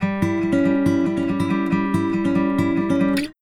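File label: Country Guitar, Pre Bridge; Digidesign; Pro Tools Essential Loops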